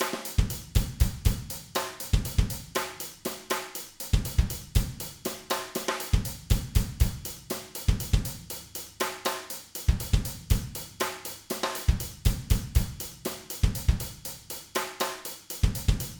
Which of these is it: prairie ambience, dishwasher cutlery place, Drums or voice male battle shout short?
Drums